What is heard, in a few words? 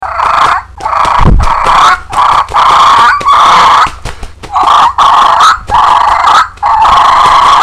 Turkey Fighting Purr